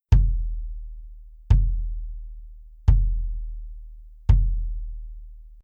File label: BASS Soft Felt